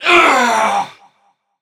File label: Knight Death; Ferea